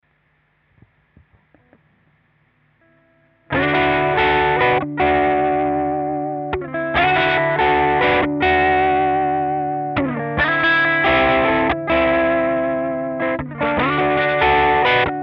D140 GT Riff Trash

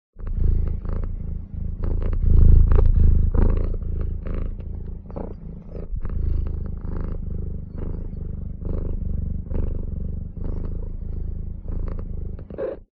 Cat purr

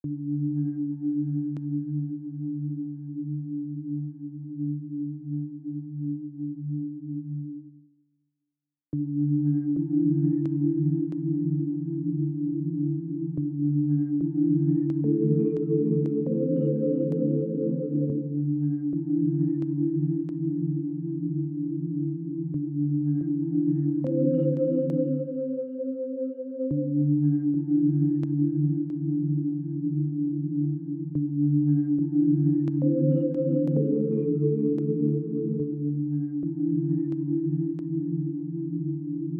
palm trees pad

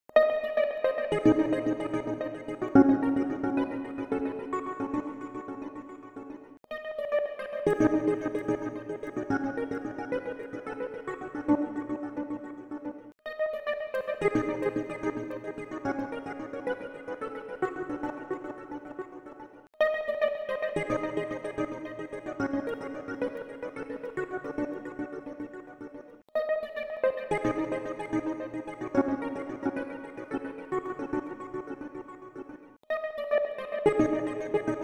Electric piano loop

